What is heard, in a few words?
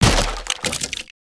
Wood Splinter; destructibles